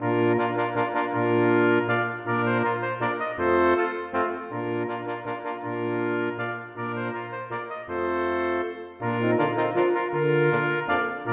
Menuet for messingkvintett; Aamodt

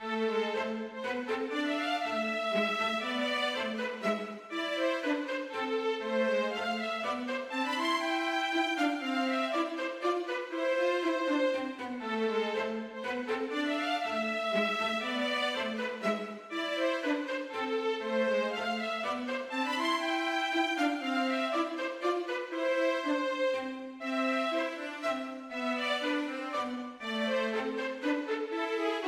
Menue for fiolin og cello; Bang

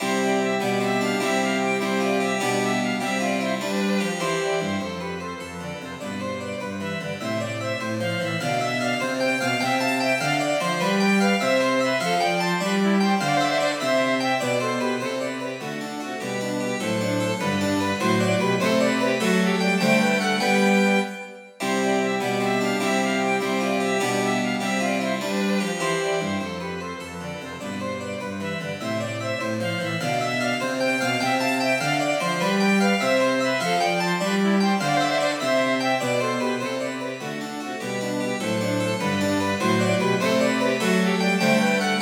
Gig for fløyte, fiolin, cello og cembalo; Hertz

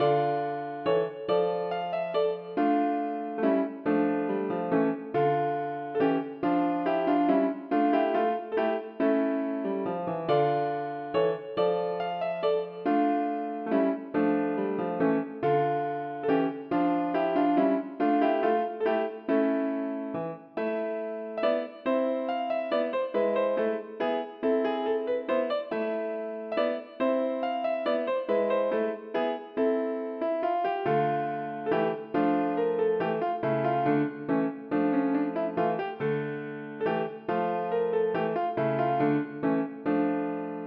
Menue for piano; Bang

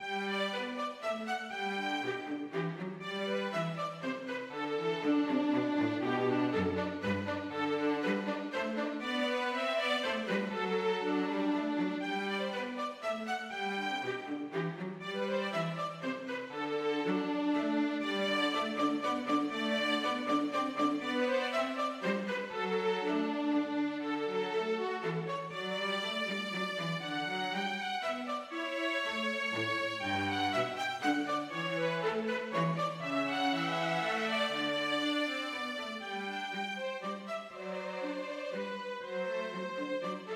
Menuet for fiolin og cello

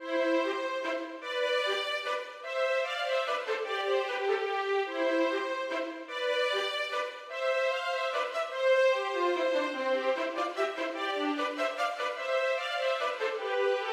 Menuet for fiolin duett; Aamodt

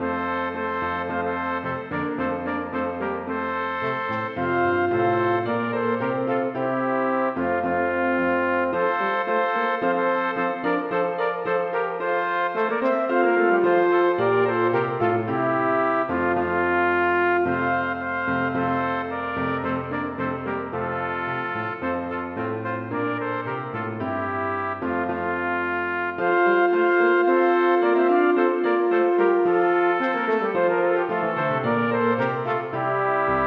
Intro for to trompeter, horn og piano

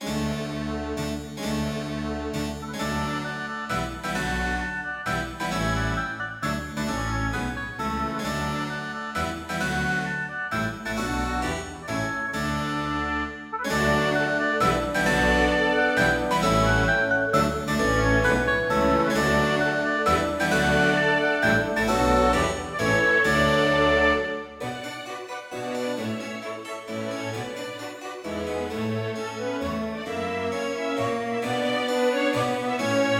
Menue for to oboer, to fagotter, strykere og cembalo; Bang

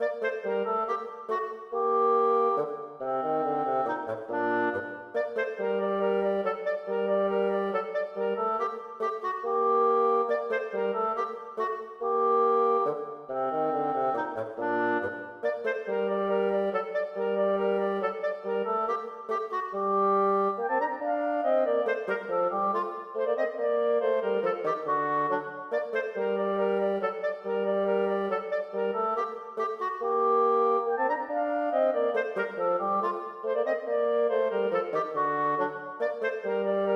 Menue for klarinett og fagott; Bang

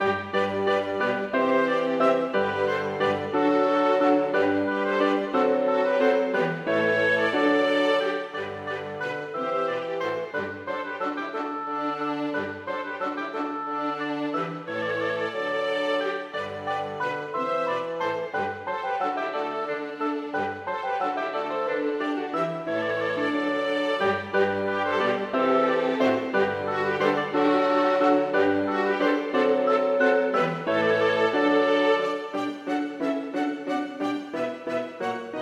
Paspied for to oboer og ensemble; Aamodt

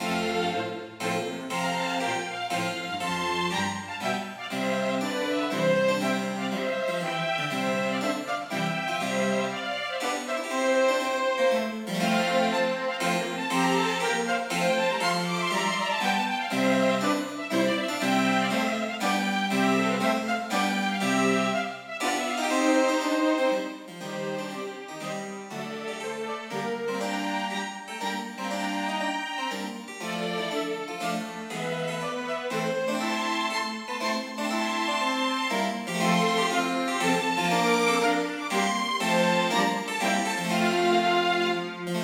Menuet for to fioliner, cello og cembalo; Aamodt

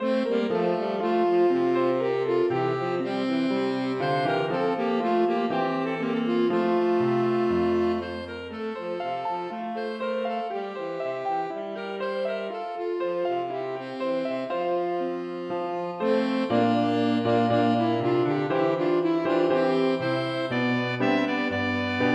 Allemand for altsax, tenorsax og piano

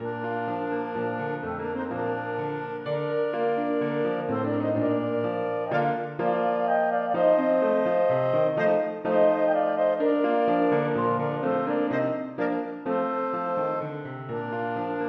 Menuet for to klarinetter og piano

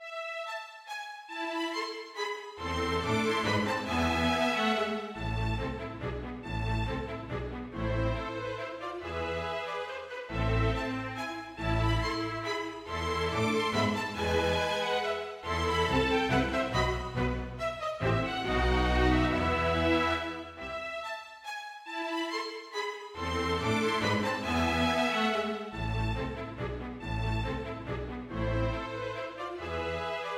Menuet for strykekvartett orkesterversjon m.bass; Aamodt

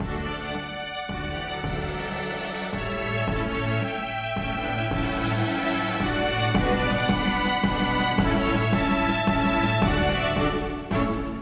Menuet for strykekvintett med ad lib trommer, med trommer

